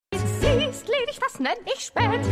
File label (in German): Gesang; Schmigadoon; Helen